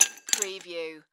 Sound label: Ice Cubes Into Glass; Sound Effects Bible